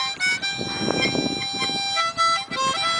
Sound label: Harmonica